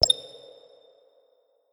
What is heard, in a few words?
hollow pitched down